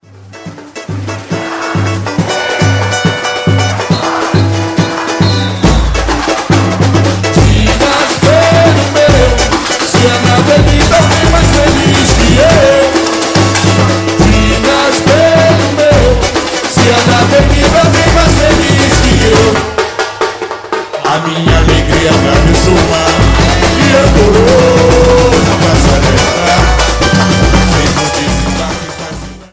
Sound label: Intro break